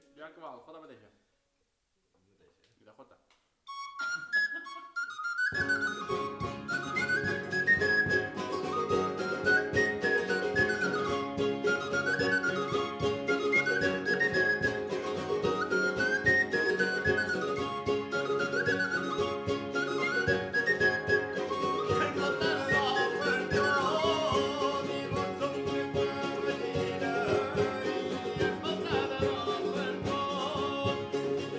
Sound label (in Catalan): Jota amb flabiol